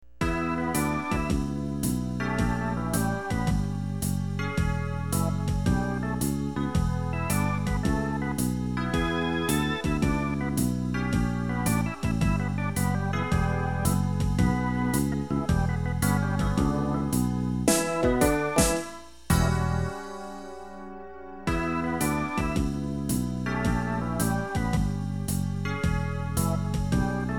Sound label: Bluesy Berg; SimCity 2000; PSX